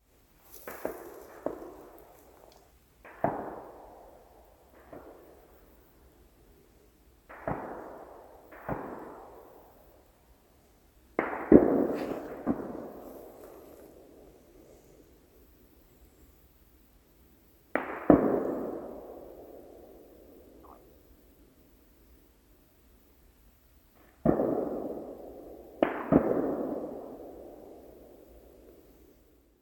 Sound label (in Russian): стрельба